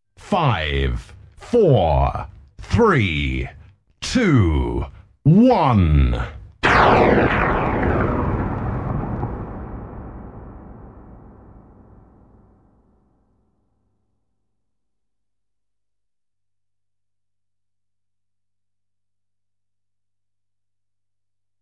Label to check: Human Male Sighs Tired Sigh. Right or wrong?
Wrong